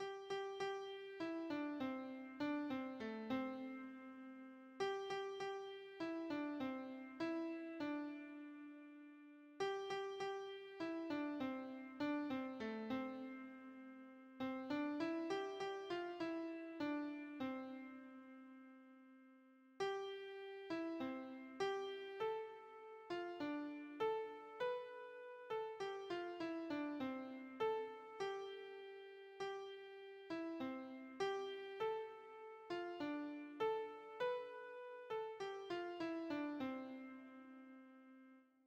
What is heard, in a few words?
sing hosanna, keyboard